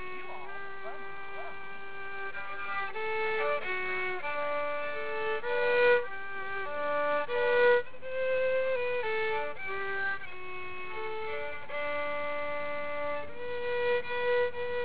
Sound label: playing violin